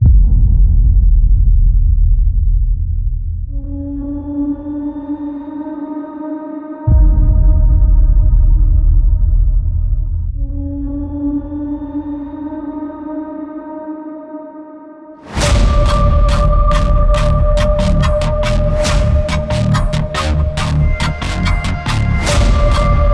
thrilling cinema sound fx; freaky loops

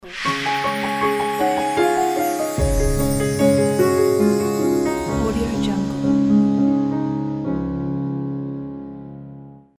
elegant piano logo

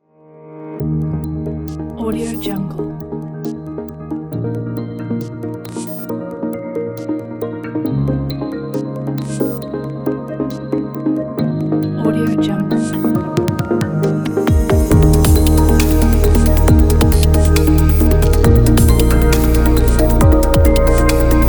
background technology music

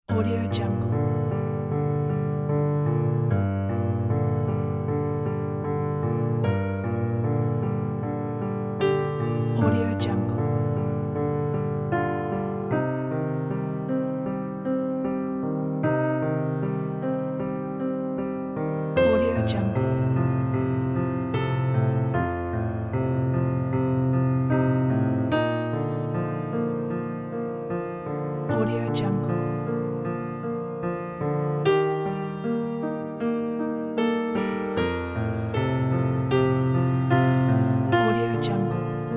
autumns walk music

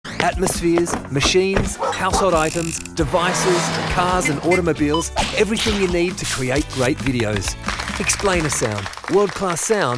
explainer sound sfx

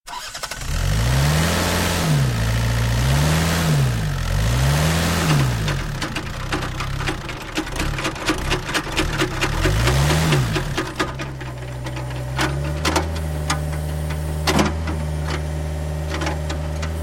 the excavator sound fx; cinematic sound design

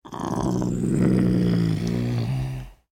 farm and wild animal sound fx; sound ideas

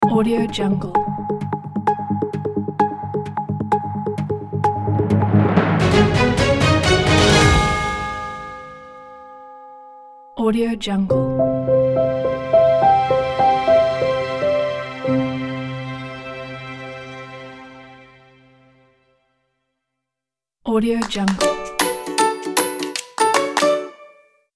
inspiring logo pack music; inspiring logo pack vii

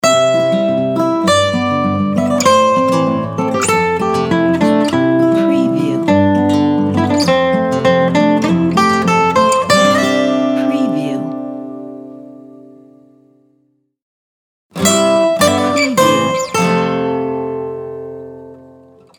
folk guitar logo music

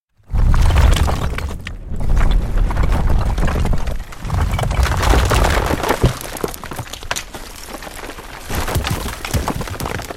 big room sound rock movement

